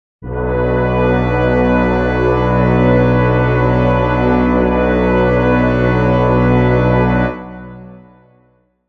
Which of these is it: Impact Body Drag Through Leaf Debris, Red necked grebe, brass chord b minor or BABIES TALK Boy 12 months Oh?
brass chord b minor